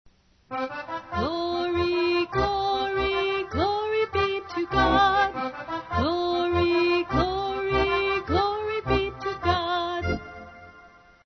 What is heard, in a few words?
Jig; Glory